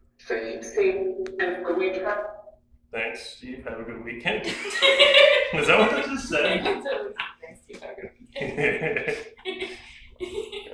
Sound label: 2nd Floor Dark Wing Phasma Box